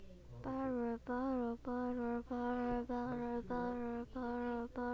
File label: bottle